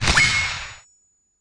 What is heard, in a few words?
bonus symbol landing; koi princess